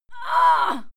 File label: female fight moan pain heavy